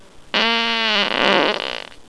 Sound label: seam ripping, farts